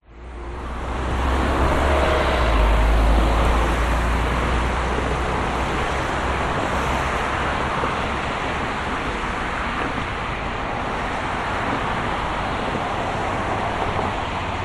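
vehicles traffic motorway city busy recorded from footbridge occasional pedestrian brisbane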